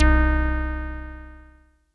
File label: SYNTH